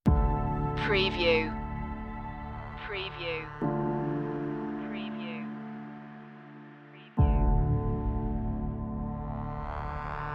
outbreak no drums

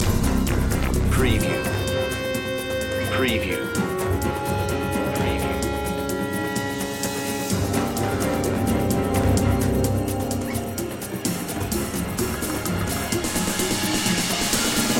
digital voodoo loop

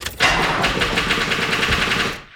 Auto Start Dead Battery; sound effects bible

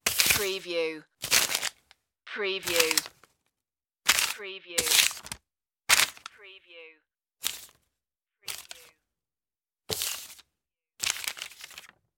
pick up piece of paper

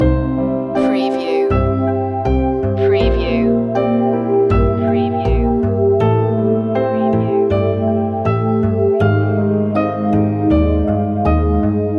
quiet love loop

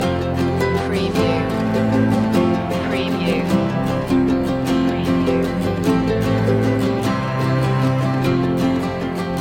the nu-folk tune loop

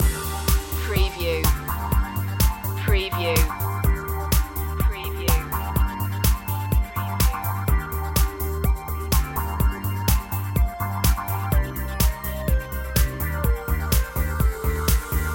beach side loop

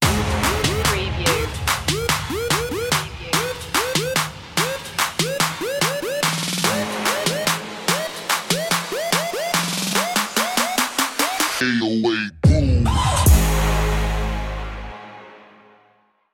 808 boom stinger

